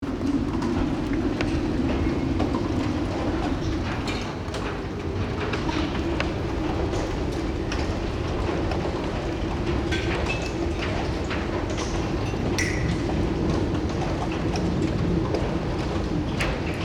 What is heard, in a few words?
dripping rain and birds shanghai suburb; Dripping rain and birds singing, a Shanghai suburb (2)